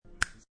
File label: Snap, Single finger snap.; Human